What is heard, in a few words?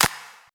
Claps & Snares